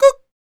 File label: FEM PERC, Vocal Jazz FX